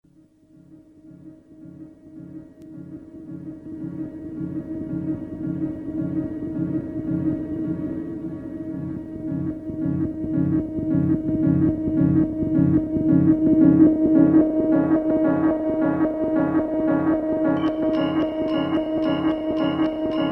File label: piano essence